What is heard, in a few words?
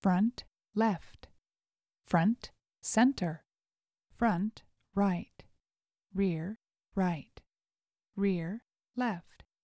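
five point tone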